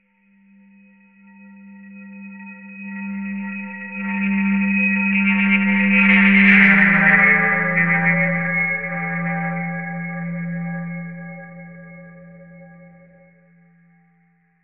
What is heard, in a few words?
im bell